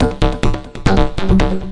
140 hexbeat, DRUM LOOPS and BREAKS

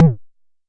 Jomox MBase, drum machine; Jomox MBase 01

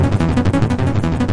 180 demented-synth, synth and electronic loops

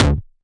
nasty stormfront, Electro FX

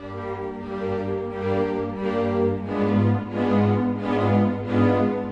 serious violas; STRINGS ORCHESTRAL CLASSICAL LOOPS

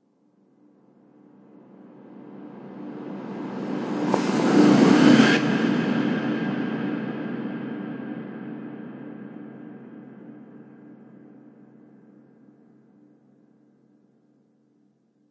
airy sweep; Ze Franks Pain Pack; sweeps and fx

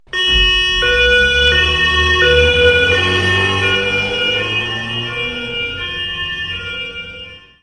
european police siren; Sound FX Real Life

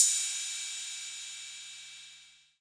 808 cym; DRUM MACHINES; 808 Extended

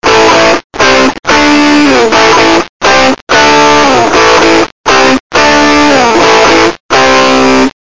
crummy riff, Guitar Noizes

